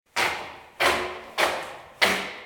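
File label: shuffling sound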